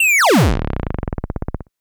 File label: Kaossilator Fast fall